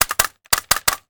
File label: Staple Gun 5x; Tools; 1001 Sound Effects